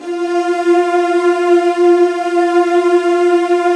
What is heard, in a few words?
F1, Virtuoso Strings